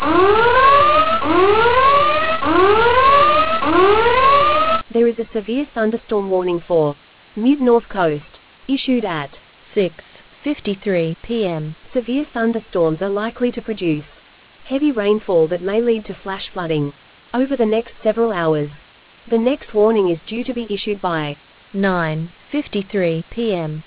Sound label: storm audio